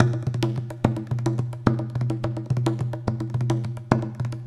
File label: EAST INDIAN DRUM; Sonic Foundry (Sony Creative Software); Vir Unis Infusion